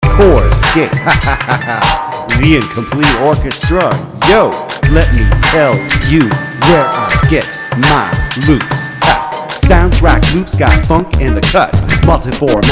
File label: Sound Track Loops 100 BPM